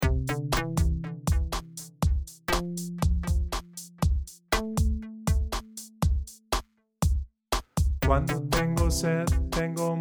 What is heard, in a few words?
bilingual children song